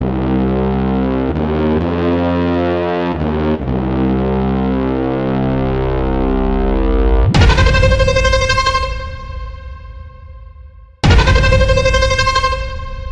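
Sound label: Mass background music Ringtone; Widda; Chengiz; Jeet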